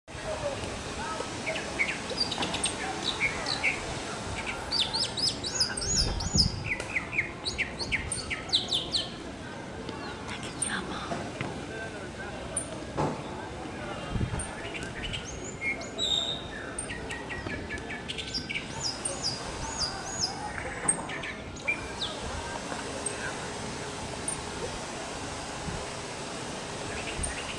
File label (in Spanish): Chisco Cantando; Lambayeque